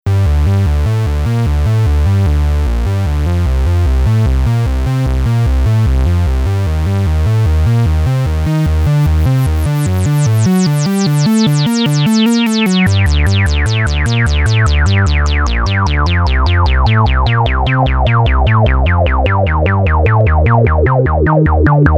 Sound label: Simple Filter Res; iSEM